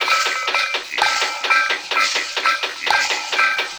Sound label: perc loop; cymatics